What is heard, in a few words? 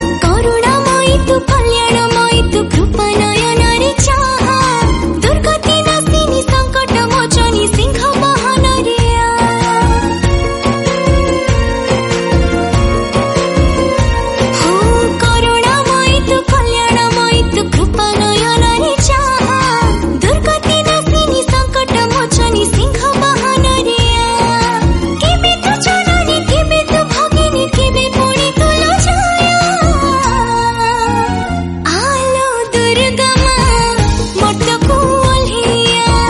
maa durga mantra odia